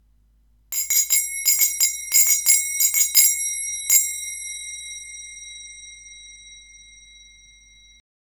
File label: brass super zils